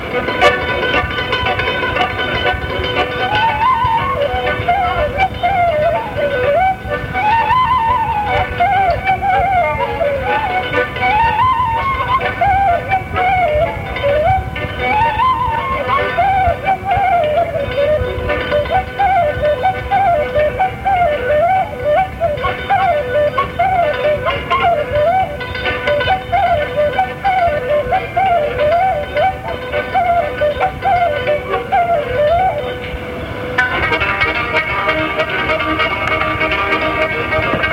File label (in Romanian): Hora Batuta, joc popular vasluian; Centrul Judetean pentru Conservarea si Promovarea Culturii Traditionale Vaslui